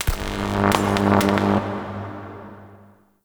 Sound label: Electricity Spark; Warframe; Ambience; Entrati